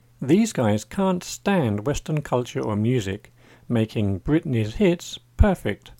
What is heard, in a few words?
loud music